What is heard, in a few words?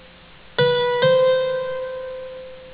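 Accent steigend (ascending appogiatura; Palmer: "appogiatura from below")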